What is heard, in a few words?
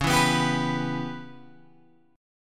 C sharp Minor 7th Flat 5th C sharp Guitar Open G